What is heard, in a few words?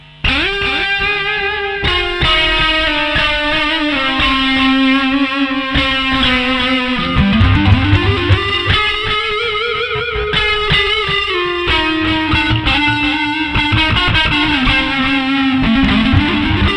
Classic Strat Drive Neck; classic strat humbucker